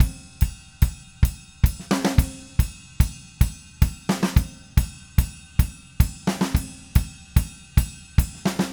Red Maple Groove 110, DW Red Maple Kit

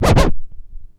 ST SCRCH, SCRATCH; AKAI S-Series CD-ROM Sound Library VOL-4

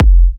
Kicks F; markus hakala

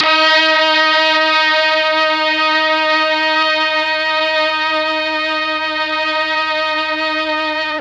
D#3 Brass, Mellotron MKII; Mike Pinder - Mellotron Archive CD

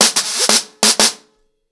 FX 90 BPM, acoustic drums; The Roots Drumloops

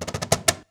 CAJ FILL, cajon fill; Univers Sons - Raricussions

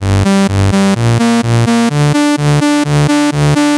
Synth, 127bpm, Nu Dance Electro; Zero-G; Soundclash; Combi Loops; Fisherpricer

